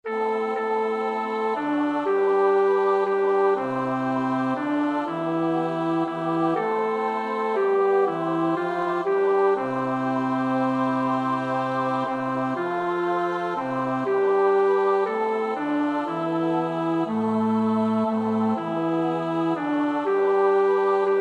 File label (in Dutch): bas; Praetorius (Michael)